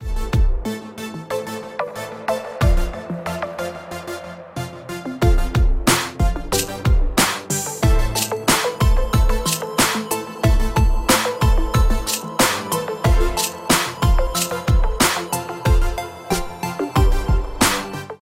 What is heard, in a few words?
Super beat; bmw i8